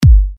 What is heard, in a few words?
Kick (electronic)